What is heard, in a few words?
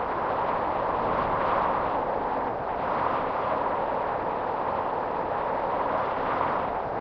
weather snowstorm; Camas